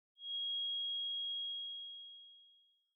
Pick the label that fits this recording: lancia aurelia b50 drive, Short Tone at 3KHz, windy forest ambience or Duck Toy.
Short Tone at 3KHz